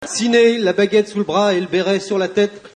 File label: sine